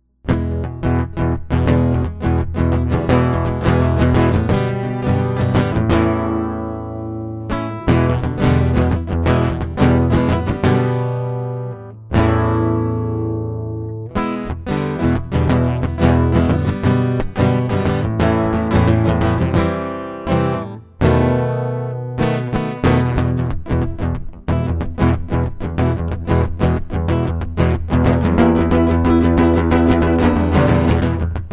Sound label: acoustic; MegaEpix